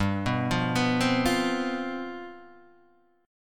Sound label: G 11th, Guitar, Standard; G11 arpeggiated